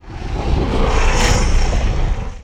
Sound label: hell rumbles; Garry's Mod; tf2